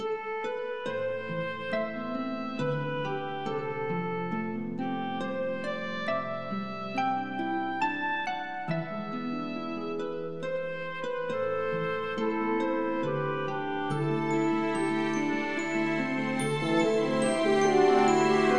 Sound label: startup; tibia client